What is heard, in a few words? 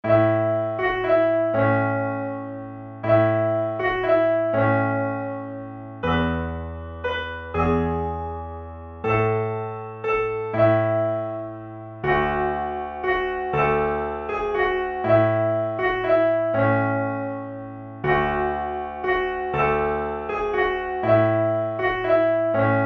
single bass note a major; silent night